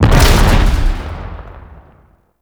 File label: Street Barrel Explosion; Warframe; Ambience; Nineteen Ninety Nine